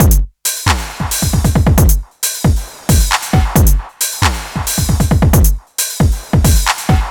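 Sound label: drum loop 135, right; House; Best Service ProSamples vol.10 - House; AKAI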